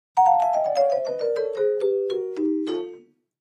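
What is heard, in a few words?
Clavichord Descending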